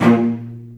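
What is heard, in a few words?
A#1, VCS arco, strings, L; East West; The Ultimate String Collection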